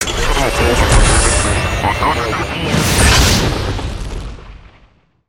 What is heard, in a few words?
rocket launch spaceship; cinematics